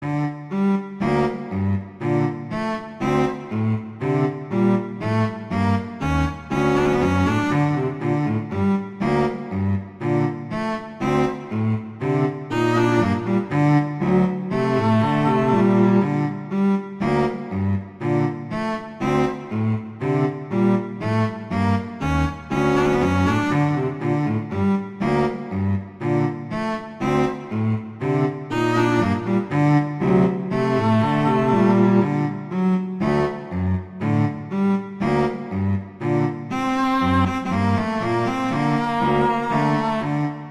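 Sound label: Duet, Tempo di marcia, two cellos; Mazas; Duets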